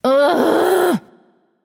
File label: "ugh"; smite; voicepack; nike